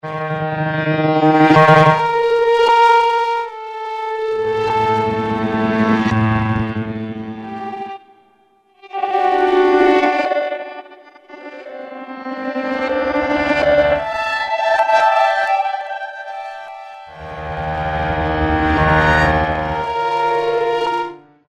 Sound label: ACID BATH GRAND, Kurzweil K2000; Kurzweil K2000 Contemporary ROM; Contemporary ROM Programs and Demos diskette